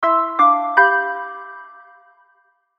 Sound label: Musical Reverb, Musical Reverb Synth Chords; Musical Sound Design; Blastwave